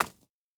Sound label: stag footstep dirt hard; Rust